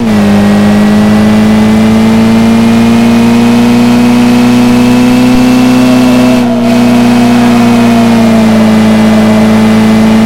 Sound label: 4th gear, vehicles